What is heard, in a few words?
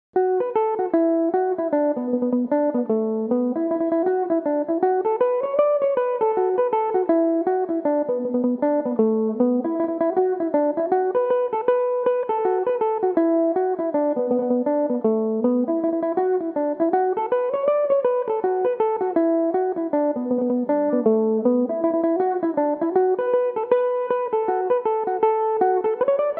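B minor, Irish mandolin; Martin Wynne's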